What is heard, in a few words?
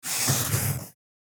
Pencil drawing smooth medium stroke